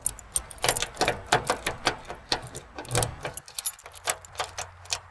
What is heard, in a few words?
car unlock; Mafie 1